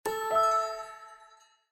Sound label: Windows Logon Sound